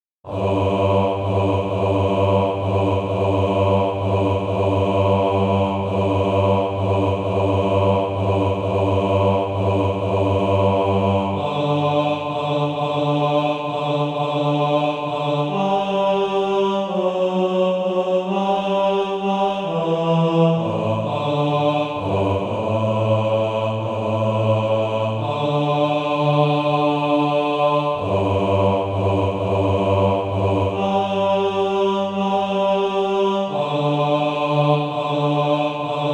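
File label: bass, Sheet music (SATB) including Practise Music; Day Is Dying in the West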